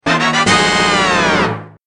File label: Bad Treasure Fanfare; Zelda